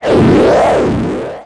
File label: Transition Whoosh Warble; Production Element